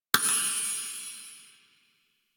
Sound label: Boon Rollover; Warframe; UI; Duviri